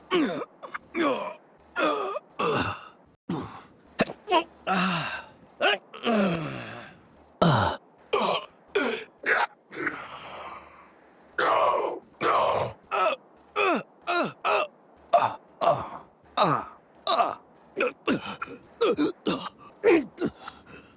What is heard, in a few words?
all male death sounds; Valorant